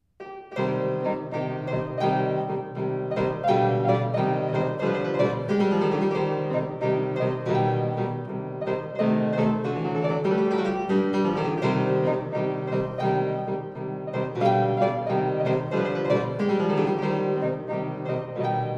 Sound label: Bagatelles for Solo Fortepiano; Ludwig van Beethoven